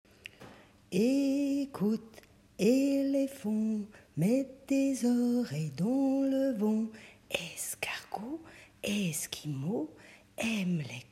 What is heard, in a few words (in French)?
E chanté